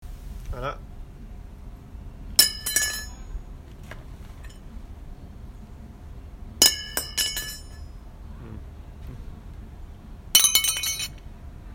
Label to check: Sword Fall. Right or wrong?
Right